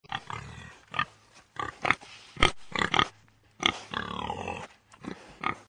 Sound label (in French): Grognements de cochon